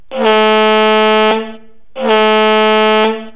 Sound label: horn; Brill Air Horn; Gulflander